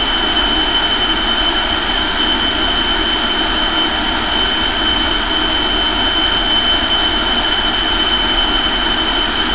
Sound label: Aircrafts Engines Mig15 In Engine R; DCS